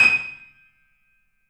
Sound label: D#, The Piano; InVision Interactive - The Piano